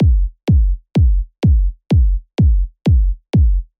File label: Pressure Kick 125 Bpm